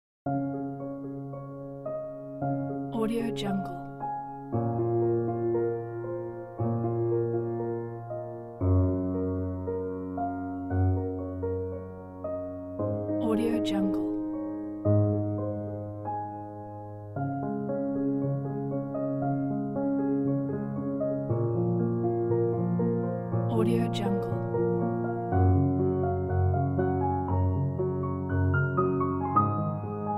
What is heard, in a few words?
simple beautiful piano